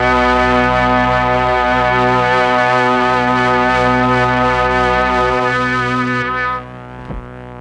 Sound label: MEL STRING; ILIO; Propeller Island